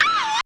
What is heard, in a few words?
vocal